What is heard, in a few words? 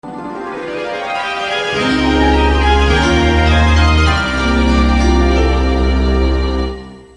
Level Begin; hey you pikachu gamerip